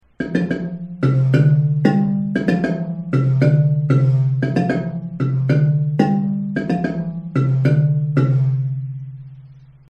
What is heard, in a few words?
bari main, marimba